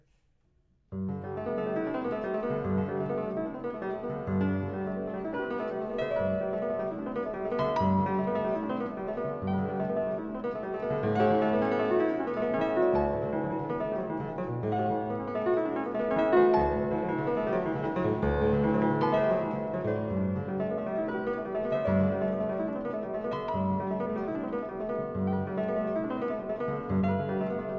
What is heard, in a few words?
Preludes Op 28 No 3 in G major, Piano; Chopin Preludes; Frederic Chopin